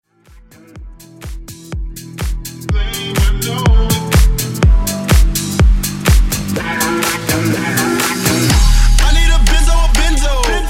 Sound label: House